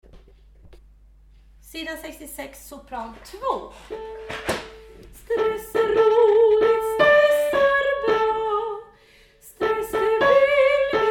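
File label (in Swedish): soprano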